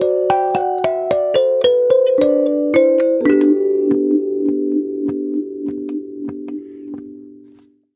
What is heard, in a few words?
Kalimba, African; Sonic Foundry (Sony Creative Software); Continental Drift Aftershocks